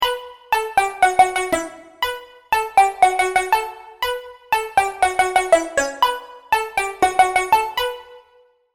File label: vital presets; chiqui audio; vital plucks presets